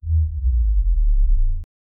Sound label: transition